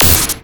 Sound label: MagicItem Sparx Shoot; Skylanders Cloud Patrol; Arcade, iOS, Mobile